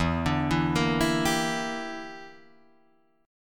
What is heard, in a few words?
E Minor 7th Flat 5th E, Guitar Drop D